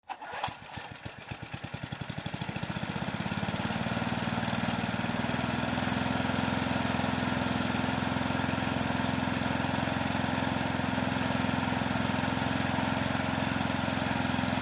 Gas Lawn Mower Start, Run, Operate At Various Distances.